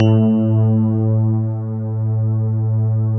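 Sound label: electric piano pad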